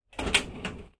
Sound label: car trunk open